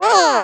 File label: warlock attack; Crypt of the NecroDancer